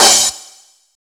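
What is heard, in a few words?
cymbal, disco; Best Service ProSamples vol.48 - Disco Fever; AKAI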